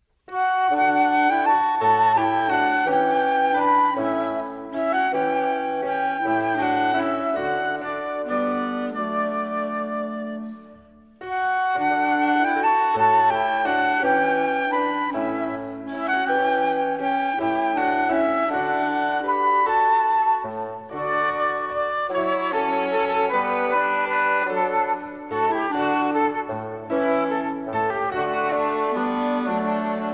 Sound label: music only; childrens songbook; Chinese